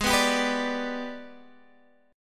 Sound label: Ab Minor Major 7th Flat 5th, down, Guitar, Standard; AbmM7b5; strummed